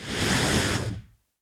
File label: Whoosh long; Ed Edd n Eddy; Adromulus Pack; EEnE